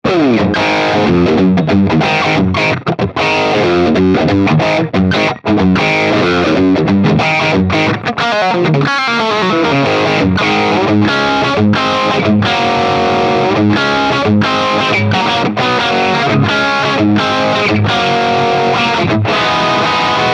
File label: Mid Gain Shuffle, guitars